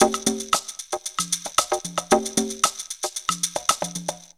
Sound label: PERC LP, PERC LOOPS; Zero-G; Funky Elements